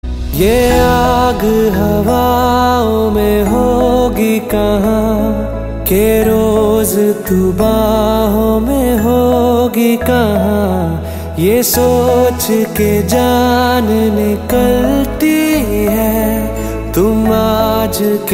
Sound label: Hindi Ringtone